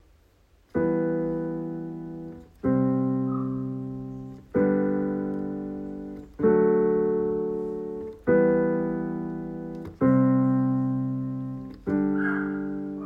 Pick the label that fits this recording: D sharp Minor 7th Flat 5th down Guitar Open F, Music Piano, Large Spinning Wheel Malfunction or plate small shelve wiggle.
Music Piano